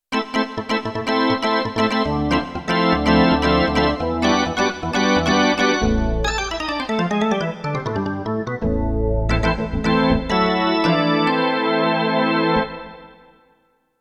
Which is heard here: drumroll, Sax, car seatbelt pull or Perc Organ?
Perc Organ